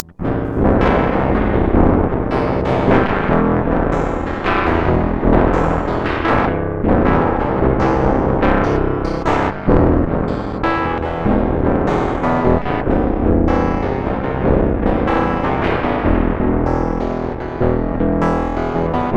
octave clang pick; deathbyaudio

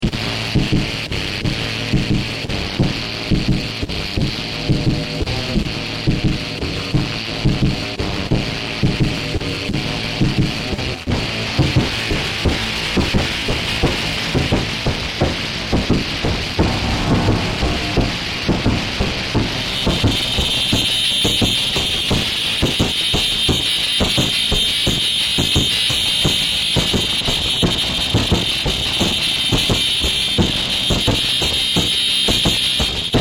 feedback song; deathbyaudio

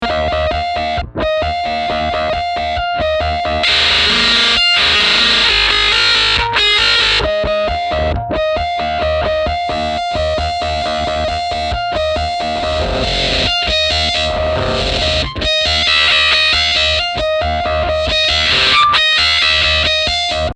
bleeper; deathbyaudio

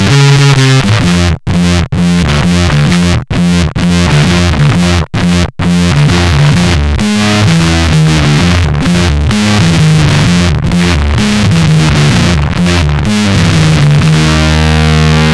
bass slow grove; deathbyaudio

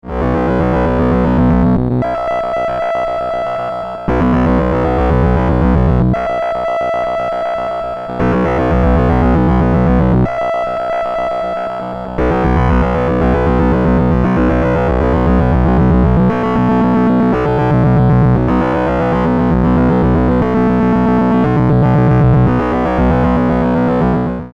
arp high speed, robot; deathbyaudio

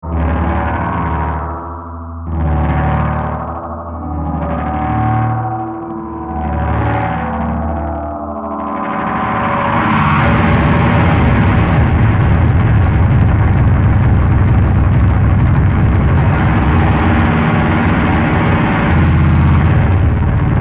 sound bending, ghost delay

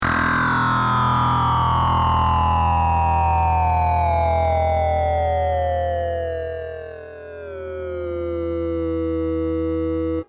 bass down; deathbyaudio

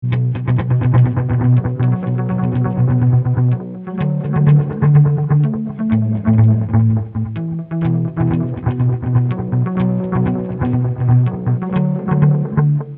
ghost delay

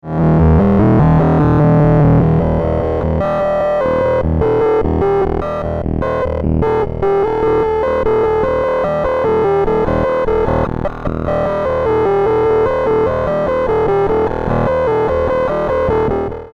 arp slow notes, robot; deathbyaudio

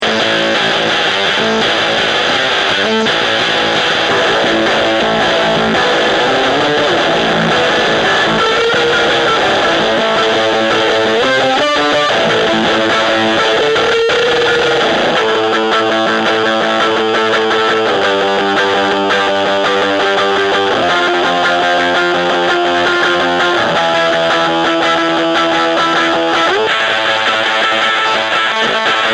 metallic shards; deathbyaudio; waveformer

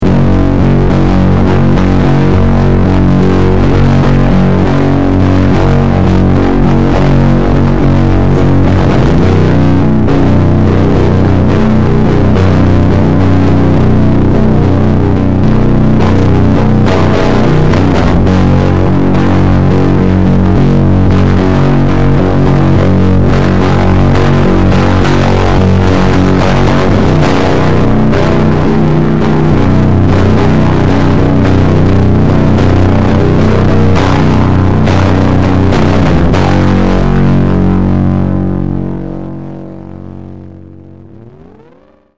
fat guitar; deathbyaudio

